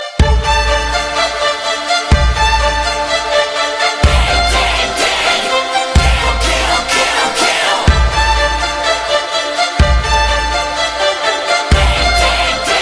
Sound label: Haunted House